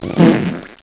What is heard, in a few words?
fart